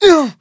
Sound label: pain